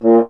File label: BRS F. Horn, Solo Instruments